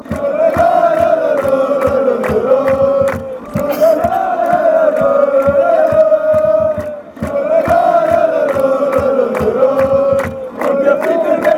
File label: fanchants; gelsenkirchen